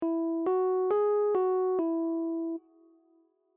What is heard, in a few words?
major three notes